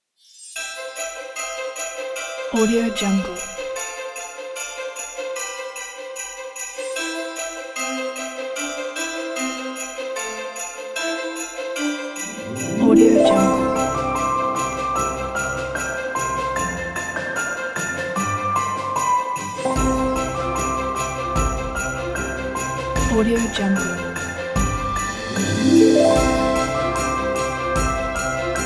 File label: christmas bells